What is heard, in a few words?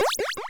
MOVE; C64 Dreams; Multi-Sound Default